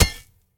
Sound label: sword drop; smite; gilgamesh